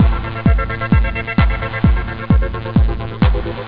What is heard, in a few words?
trance mix